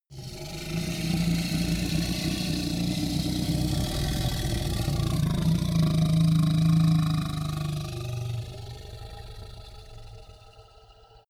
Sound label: minotaur grunt long; kreaturen